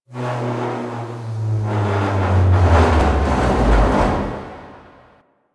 Destruction Texture; Warframe; Corpus; Railjack

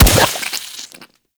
Nox Rifle Projectile Explode; Grineer; Chem Strike; Weapons

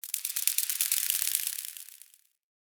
Wetland Forest Day Insect Close; Midrath Island; Ambience

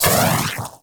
sash impact; Armillary Sash; Warframe; Nezha

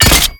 Pistol Dry Fire; Warframe; Scaldra Pistol

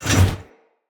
One Handed Axe Elite Whoosh Heavy; Weapons; Enemy; Axes; Sinecure One Handed Axe Elite

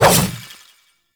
Two Handed Nikana Swing; Warframe; Duviri; Dax

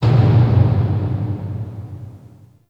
Factory Thud, ambience; Warframe; TheNewWar; Zariman

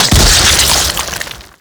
Infested Eris Cyst Explosion Single; Destructable Cysts

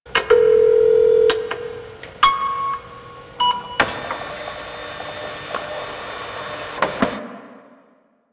Office Fax Machine Misc; Warframe; Ambience; NineteenNinetyNine

